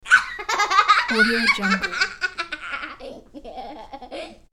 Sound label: girl laughs; hollywoodedge